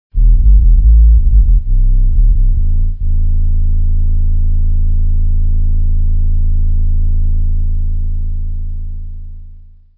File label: infrabass